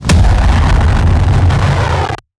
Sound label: Player UI; Five Nights At Akers; soma